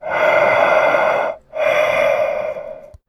shape breath; tf; slender

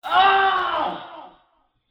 scream

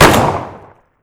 shotgun fire; weapons; hl2dm

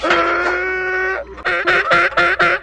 scream; tusken

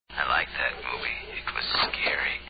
scream start

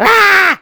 "uaaah!"

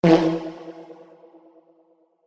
fart; hl2dm

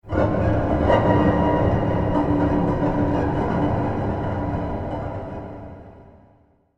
glimpse, music; tf; slender; tank

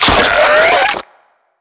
pre explo; slender; attack of the radioactive thing; minion

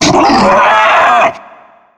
charger spot prey, zombie; Left 4 Fortress

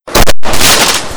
fireworks spark; tf

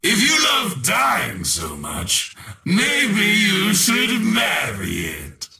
spawn; death wish

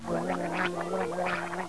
gargle

